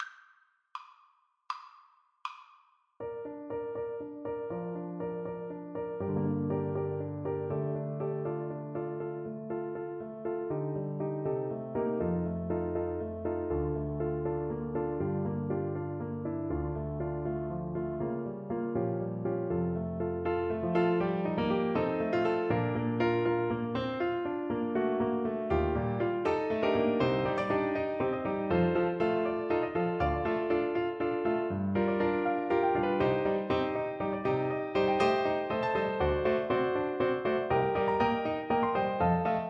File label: its peaceful here, Tuba; Rachmaninoff, Sergei - Romances Op. 21, No. 7 It's Peaceful Here